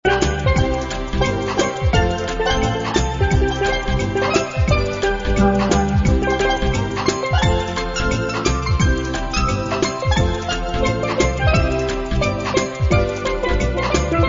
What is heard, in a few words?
Mambo Dancing